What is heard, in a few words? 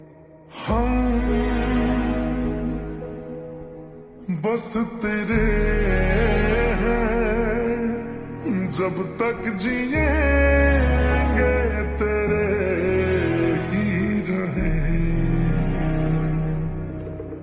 Slow Reverb Version Ringtone